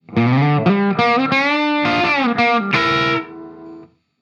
ソロっぽいの

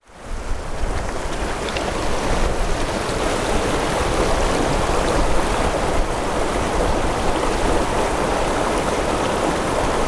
川の音

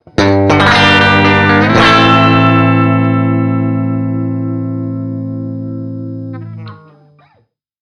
マイナーアルペジオ

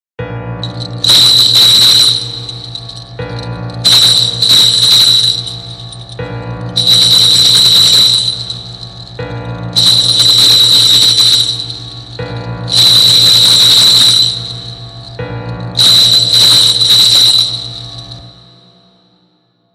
和ホラー

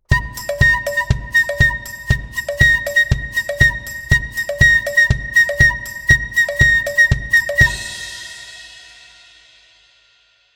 和ジングル

